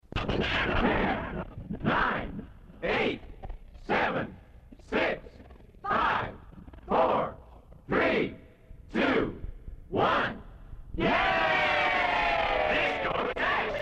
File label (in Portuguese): Radio Contagem Regressiva Disco Dance; Rádio Jovem Pan